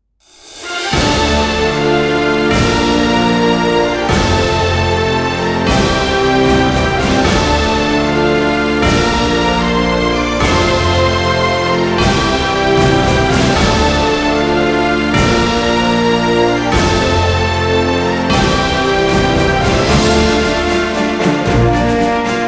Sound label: Epic Success